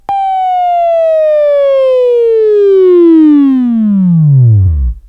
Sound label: Buzz; Labview-Program; Sweeping Training program